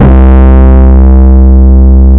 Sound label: BASS, C2; Zero-G; Chemical Beats; BASSES